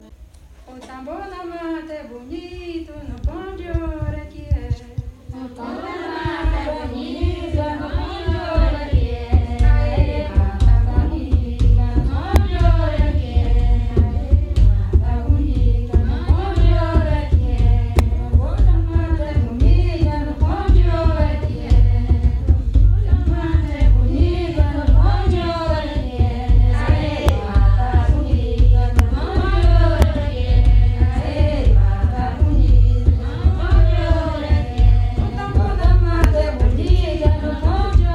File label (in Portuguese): tambor na mata; tenda sao jose